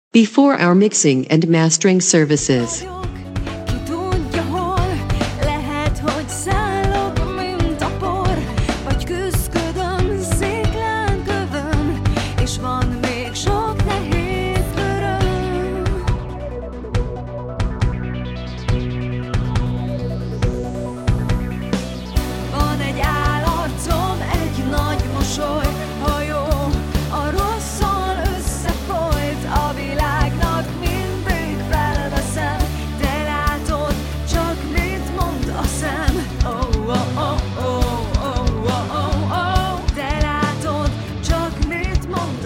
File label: poprock